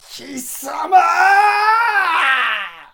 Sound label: Samurai shout